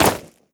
step run, footsteps player debris

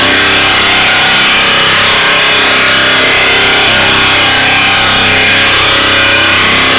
manhack loop close; npc; Half Life Alyx

